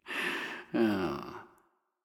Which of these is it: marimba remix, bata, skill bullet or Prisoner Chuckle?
Prisoner Chuckle